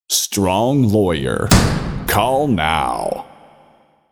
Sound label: LAWYER, lawyer jingles; STRONG LAWYER